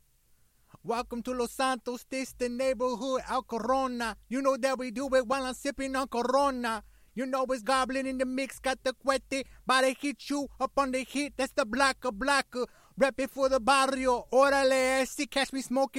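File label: hip hop 90 Cm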